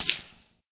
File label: money pile break